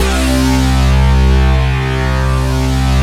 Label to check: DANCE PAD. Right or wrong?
Right